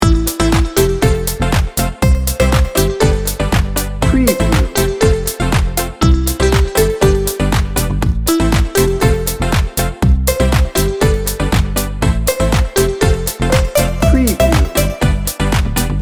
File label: time to party loop